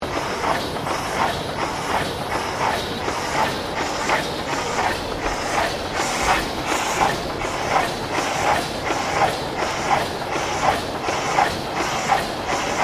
Steam Engine Donkey Loop, Colonial era machinery; SOHO; 1860 BIRMINGHAM

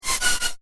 Abstract Fast Air Whoosh

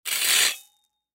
Rake Aluminum, Rake Aluminum Metal On Gravel; Single Stroke; Yard Tools; Blastwave

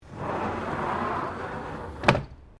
Door Sliding Exterior Aluminium Flyscreen Close

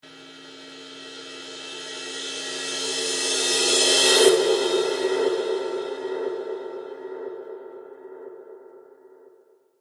Reverse Cymbal Whoosh

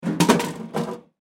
Industry Vending Machine Dispense Soda; Machinery; Machines

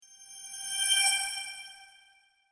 Fairy Sparkle Whoosh

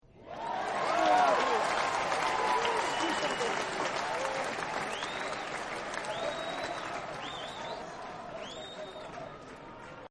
Cheer, Crowd; Crowds; Backgrounds; Partners In Rhyme

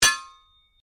Strike Metal On Metal Heavy Ring

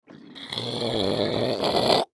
Human Spit; Spit Big Element Male 20 Yrs; Human Spit Big Element Male 20 Year Old; Spitting; Blastwave

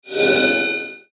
Sweeper Metal Ring; Production Element Imaging Element Accent Transition; Sweeper Sound Effects

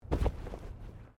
Body movement Impact Roll

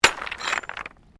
Digging Pick Stones; Various digging tools and processes; Construction Sound Effects; Construction (Sound Effects:Backgrounds)

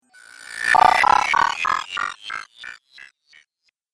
Cartoon Game Spell Time Warp; Magic Sound Effects

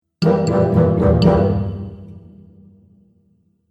Cartoon Fun Musical Element

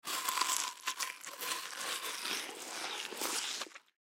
Human Bite Food; Bite Food Watermelon Slow Juicy; Eating; Blastwave

